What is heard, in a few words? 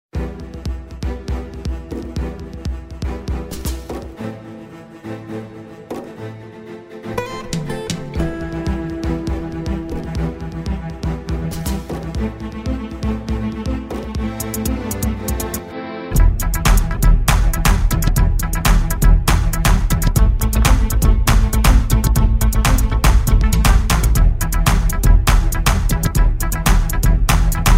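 riddim instrumental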